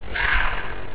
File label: woosh